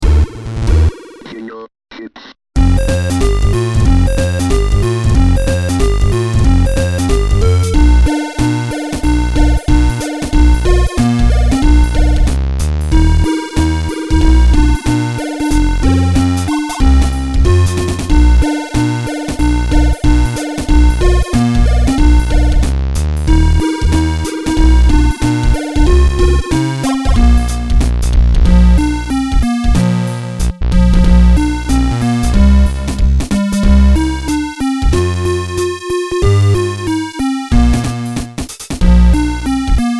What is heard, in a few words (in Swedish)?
Jingle Chips